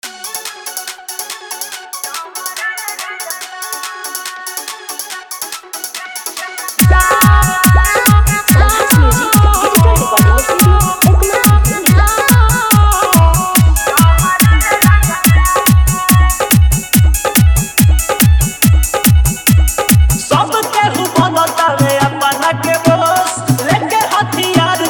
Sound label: Hard Bass Remix